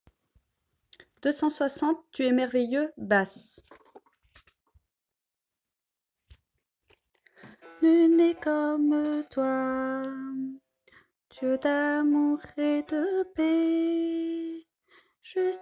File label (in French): basse